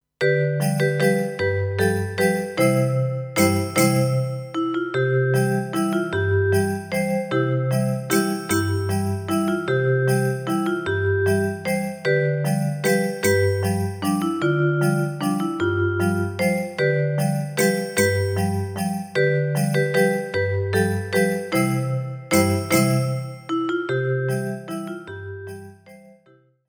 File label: Waltz